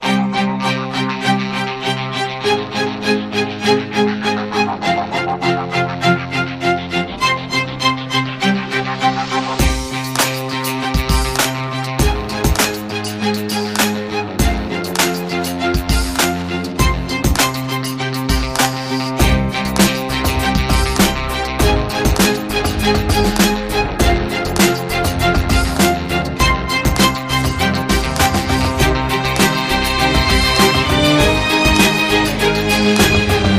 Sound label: Strings